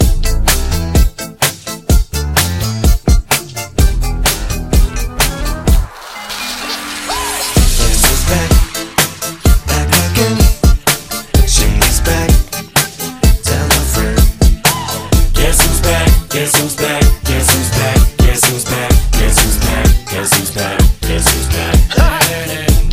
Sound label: Club Mix 127bpm Am; REDRUM